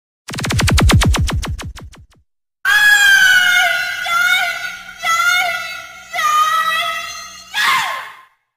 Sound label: AY AY AY AY AYYY; Sonido TABIQUE